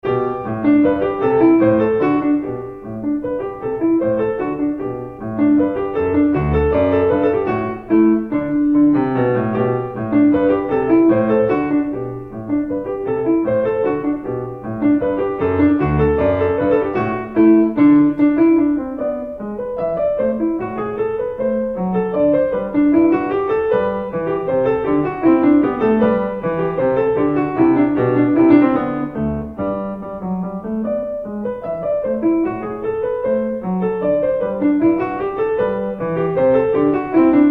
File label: Minuet in G major; Marlais Book 3